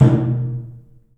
DRUM; The Piano; InVision Interactive

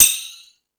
FX Sounds, Drum Machines

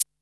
DR-660 Perc, Drums, Boss DR-660

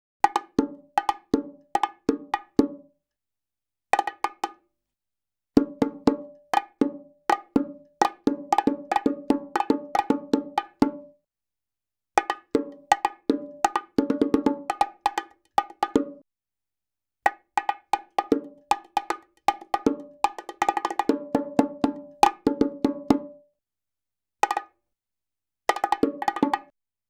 Salsa Picante; Masterbits; World Zone VOL-3

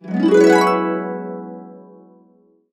E, Harps; USB Soundscan vol.62; AKAI

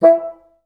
BNS2STAC E3, Advanced Orchestra; Best Service; Peter Siedlaczek's Advanced Orchestra

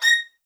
MED ATT A# 7, soft violins; Q Up Arts; Denny Jaeger Master Violin Library

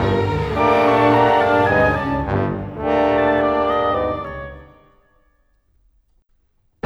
Cinematic Orchestra; Sonic Foundry (Sony Creative Software); Orchestral Series VOL-3; Cinematic 11

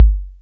SC ANA KICK

